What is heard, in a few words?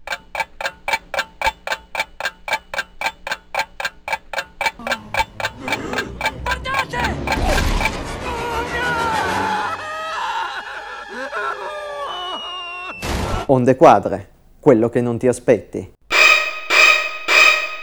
jingle